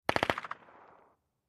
MP5 9 mm Machine Gun Burst From Distant Point of View, X4